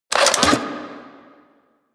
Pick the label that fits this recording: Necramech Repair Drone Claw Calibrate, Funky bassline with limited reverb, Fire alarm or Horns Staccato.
Necramech Repair Drone Claw Calibrate